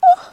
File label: female orgasm